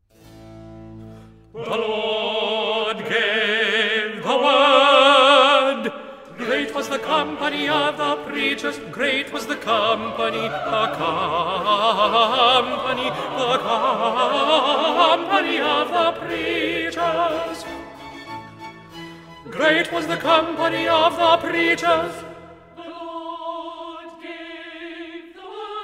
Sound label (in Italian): tenori; The Lord gave the Word; Messiah; handel messiah